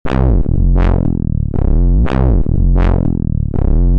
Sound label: modulation loops